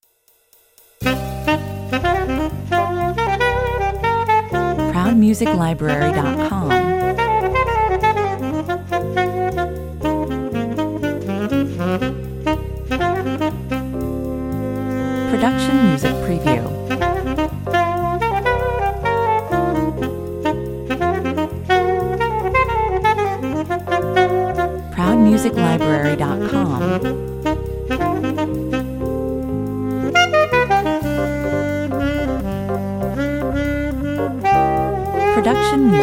Sax melodies and solo ring out with a slight feeling of impatience and unease in this energetic, straight jazz; Sax melodies and solo ring out with a slight feeling of impatience and unease in this energetic be-bop track. Electric piano, bass and light cymbal accomp